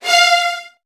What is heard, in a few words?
VN 16TH, 3F2; ILIO; Kirk Hunter